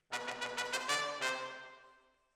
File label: Decca, Jazz for piano